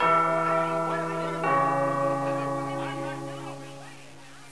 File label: bell; cstrike